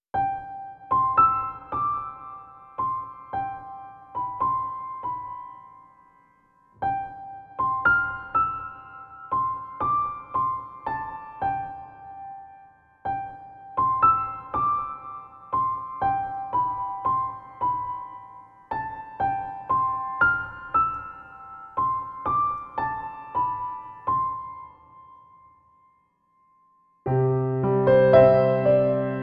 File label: Rockabye Baby, Piano Sleep Music